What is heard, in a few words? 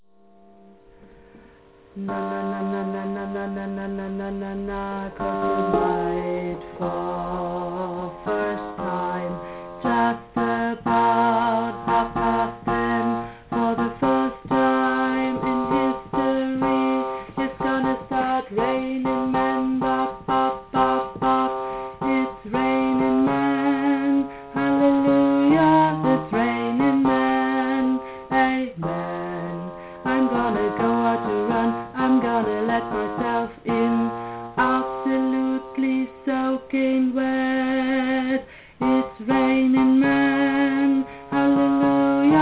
Bass